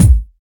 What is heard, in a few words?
original bass drum A key; kick